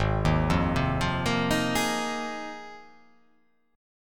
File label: G sharp 6th Add 9th G sharp